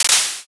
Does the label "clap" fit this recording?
Yes